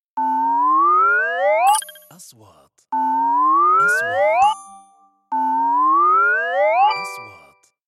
نغمة نقره الكترونية, transitions

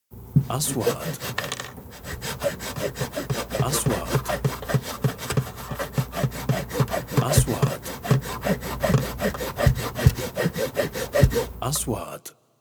منشار ومطرقة; sound effect

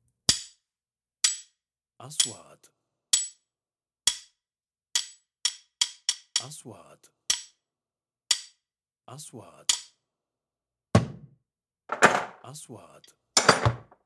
مطرقة حديد; sound effect

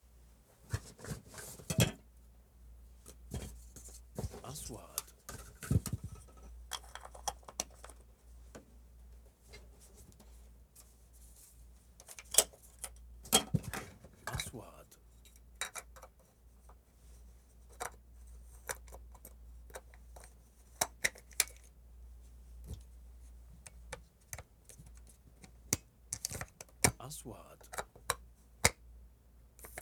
صوت حقيبة التصوير; shooting

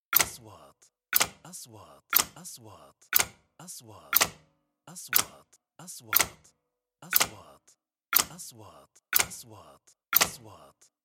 عداد زمني; transitions

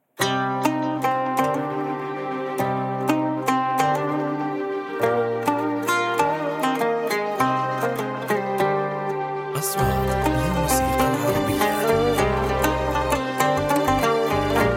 موسيقى تصويرية سياحية; travel

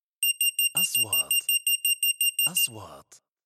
صفارة إنذار; transitions

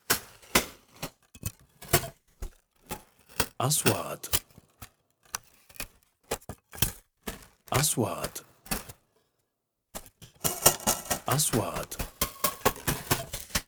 حفر يدوي بأرض رملية; sound effect; رمال

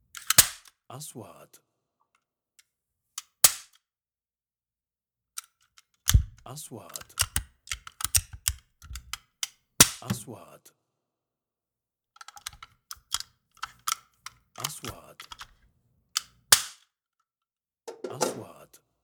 دباسة معدنية; sound effect

